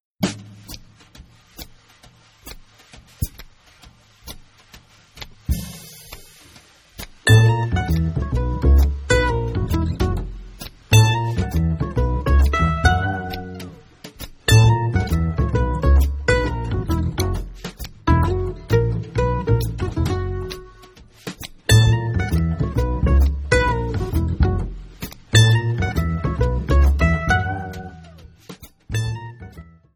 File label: groove